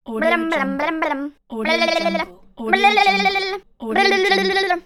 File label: cartoon tongue jeer